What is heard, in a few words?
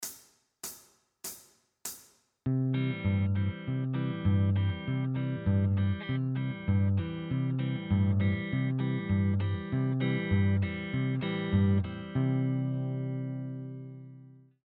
Backing Track; bluegrass guitar mastery